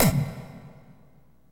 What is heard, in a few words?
ZAP RIM VERB, Techno Trance; E-MU Formula 4000 Series Vol. 2; OrbitPercMaster